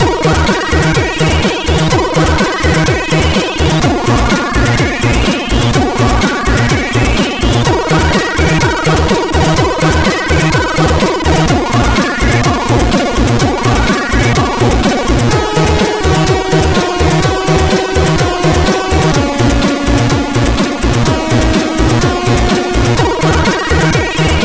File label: tune, 8580; Siebold Markus